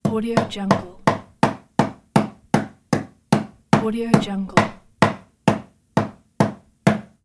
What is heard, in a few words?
hammering nails